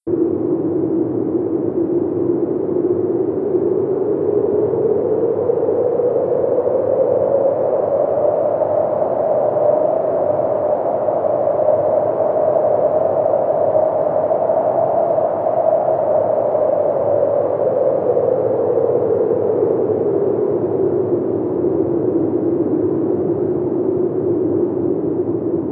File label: Wandering Wind